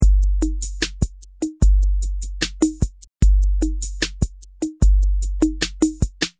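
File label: drum loop, underground; ballad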